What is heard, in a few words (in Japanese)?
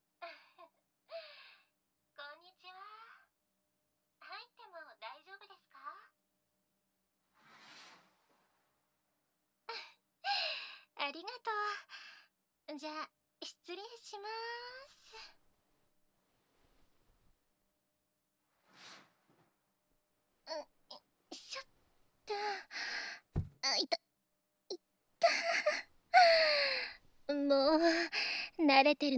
あまとろ 囁き 耳舐め 手コキ♪ ノイズ あり; ASMR